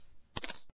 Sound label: Single coin; papas cupcakeria online windows gamerip 2013